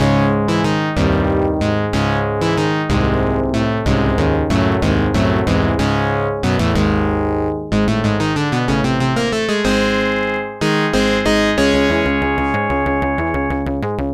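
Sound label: background music; Side Arms Hyper Dyne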